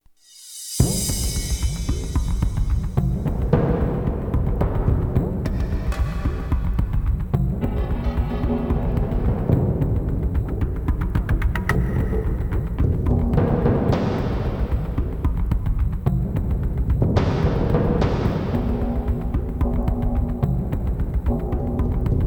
Rand Music; moul

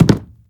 Soft Wood Jump

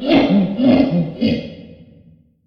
Gorilla Rand; moul-assets

